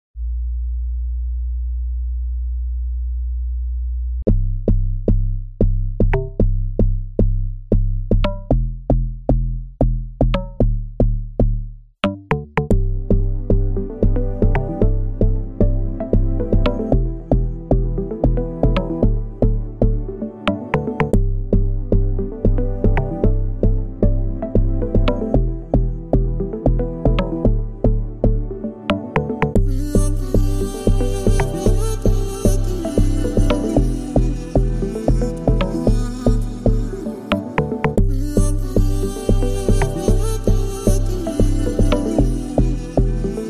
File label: INSTRUMENTAL VIBE TRACK AFRO VIBE WORSHIP SOUNDSCAPE afrobeat